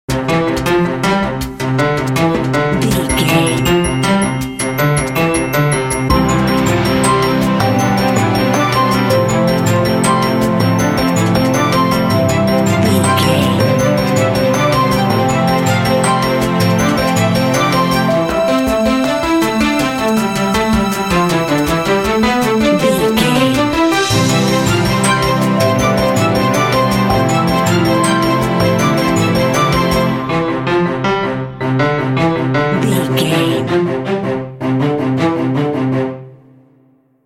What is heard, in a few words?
perpetual symetric motion